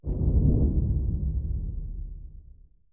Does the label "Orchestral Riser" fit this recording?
No